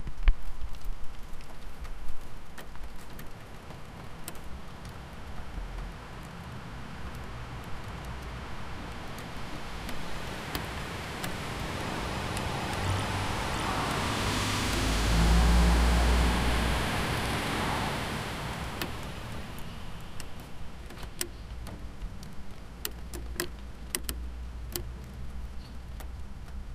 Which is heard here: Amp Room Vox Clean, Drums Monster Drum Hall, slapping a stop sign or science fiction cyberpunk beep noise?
slapping a stop sign